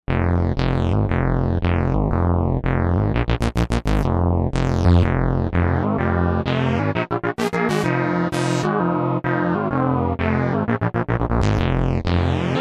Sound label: Viper Saw Bass; Novation UltraNova; Factory Patches